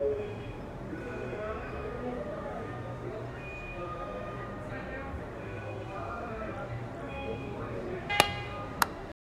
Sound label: country store sounds